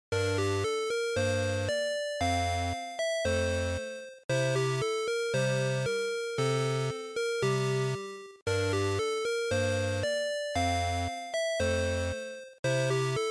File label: GB RPG Field